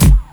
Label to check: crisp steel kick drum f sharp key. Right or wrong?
Right